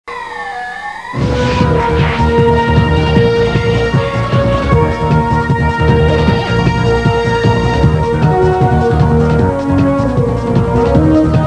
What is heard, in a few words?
techno